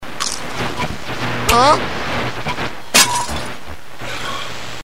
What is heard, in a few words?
Adjust Radio Crunch